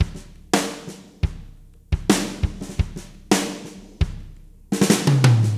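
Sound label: 86 bpm, beat loops; Zero-G; Monster Beats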